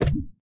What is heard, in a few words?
symbol Stop On Ground; magic maid cafe